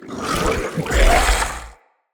Corrupted Sproutfolk Attack Claw Throw, Enemy, Vocals; Characters